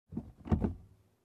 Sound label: Noise outside of car